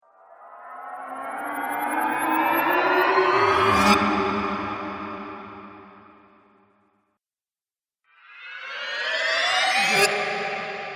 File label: Spell Pack, Magic Sound Effects; 4X Spell Pack - 04; Role Playing Game